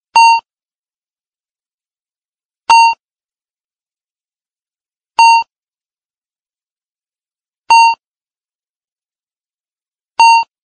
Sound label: chat sound